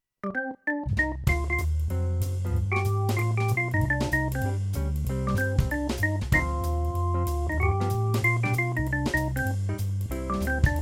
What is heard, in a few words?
organ; fp 5 demo